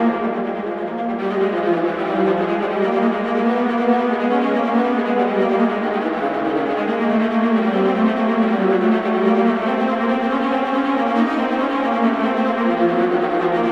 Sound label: viola